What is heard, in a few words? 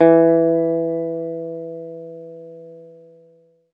ZITHER; Best Service; Alpine Volksmusik